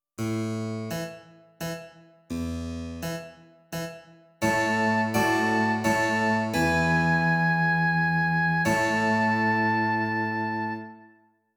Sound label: Continuo